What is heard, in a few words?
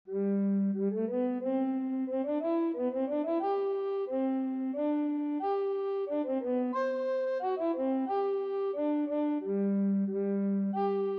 Bass Recorder; Horses Bransle